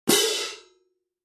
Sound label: 18 K Constantinople Vintage Orchestral Medium Light Pair, cymbal